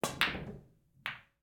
billiards pool sports strike hit ball shot rail collide; fvtt sonniss gdc audio